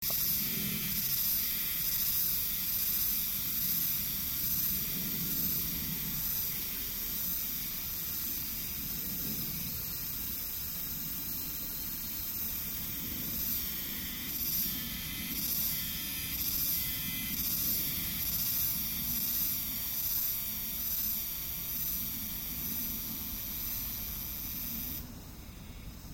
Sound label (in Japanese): himeharuzemi